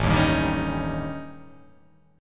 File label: A 7th Flat 5th